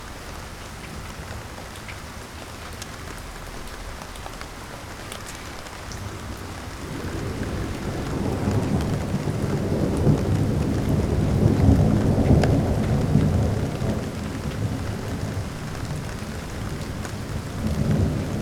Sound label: Sounds of Rain and Thunder